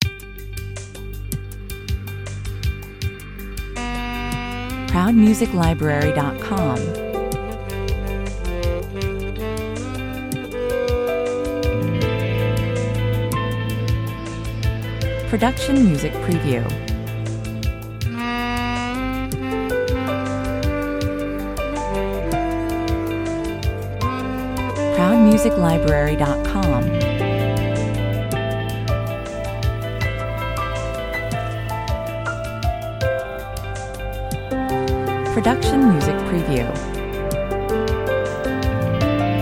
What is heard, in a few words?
sax ambiance 160 bpm